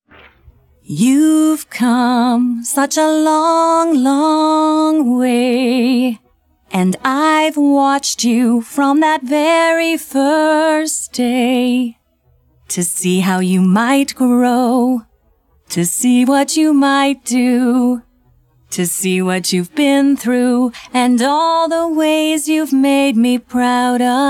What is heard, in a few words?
Ballad, Acapella; Season 3